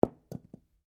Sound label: Cartridge shotgun 12 gauge impact carpet, shell casings; shell casings of different calibers impacting on different surfaces